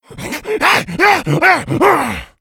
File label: rage, infected, male